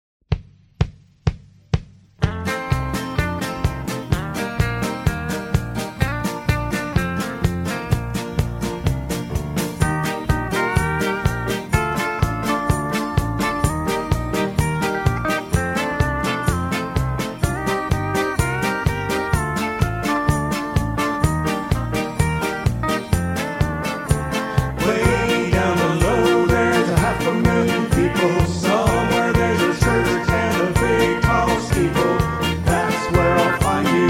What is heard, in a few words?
harmony